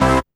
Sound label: dance chord